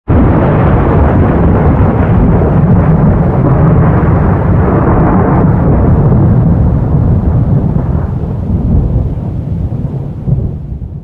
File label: Piezo crystal far; Barotraumatic